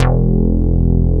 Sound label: MINIMOOG; Greytsounds VOL-1